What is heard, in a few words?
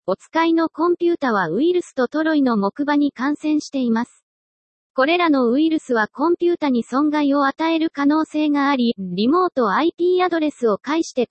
Fm7 alert